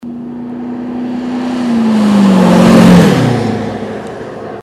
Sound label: 64 ford pass by